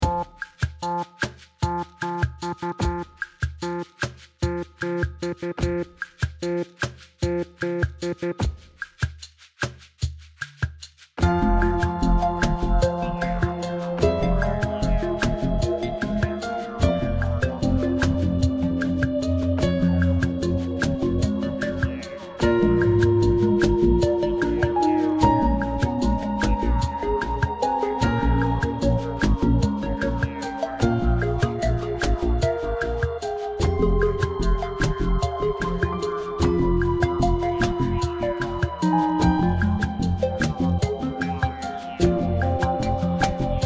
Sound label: slow 75 bpm